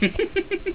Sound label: teehee